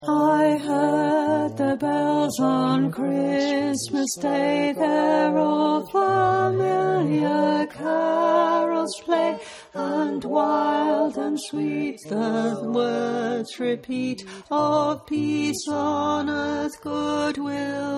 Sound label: Alto, choir; I Heard the Bells